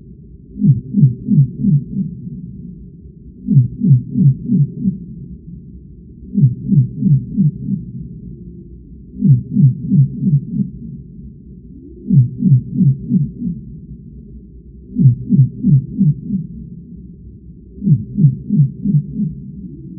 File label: Bioduck